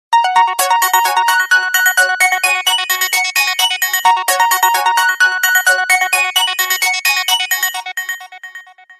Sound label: sony xperia sound; nhac chuong